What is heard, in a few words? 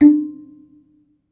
BASS KALI, Kalimbas